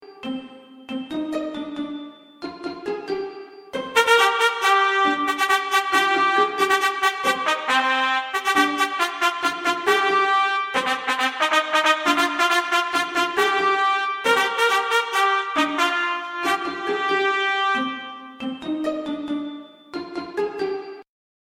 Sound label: Live Horns